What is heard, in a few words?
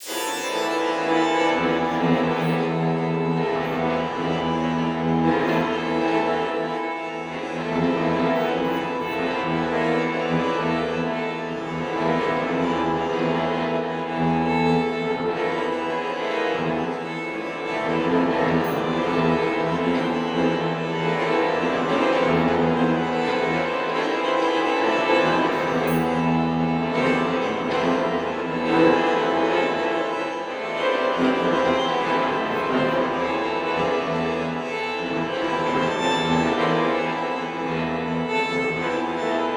Strings; Xenakis